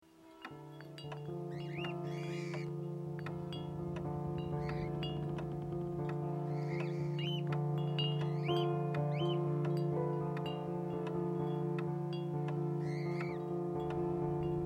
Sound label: Melodic Expansion